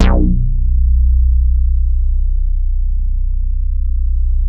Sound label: STEREO BASS R, Bass Analog & Synth; USB Soundscan vol.12